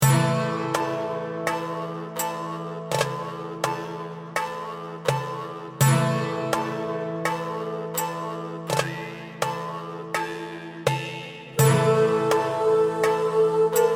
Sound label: bgm zone village; goken